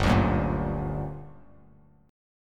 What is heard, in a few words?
G Minor Major 7th, Bass Standard; strummed; 3,5,4,3